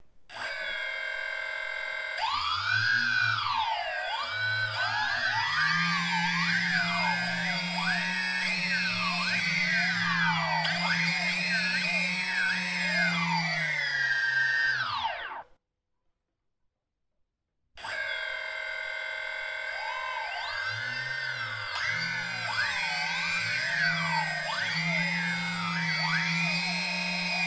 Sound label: Drone sounds Robot Motor